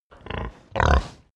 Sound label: pig grunt close